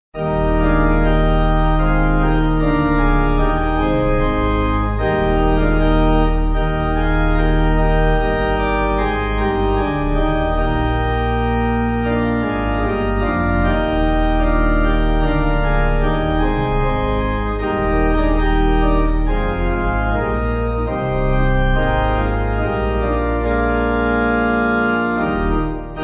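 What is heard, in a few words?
Pipe, hymn backing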